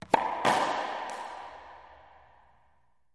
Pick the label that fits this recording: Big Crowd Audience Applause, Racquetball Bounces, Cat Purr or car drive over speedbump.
Racquetball Bounces